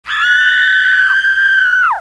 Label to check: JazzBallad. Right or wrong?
Wrong